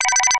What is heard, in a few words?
default beep; win98